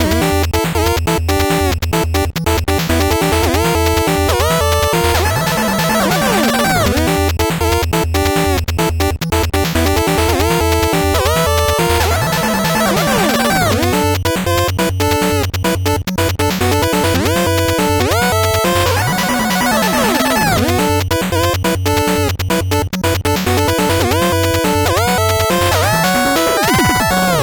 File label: 8bit bgm slow